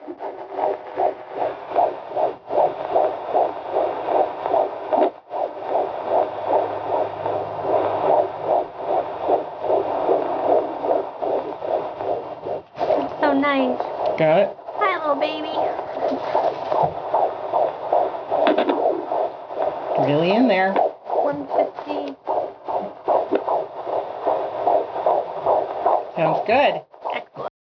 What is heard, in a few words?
Babys heartbeat